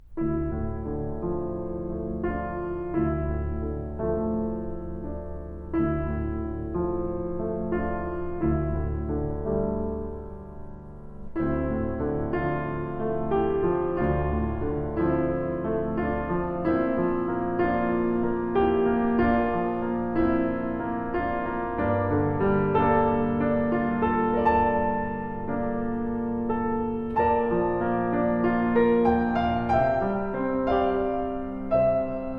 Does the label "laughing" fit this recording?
No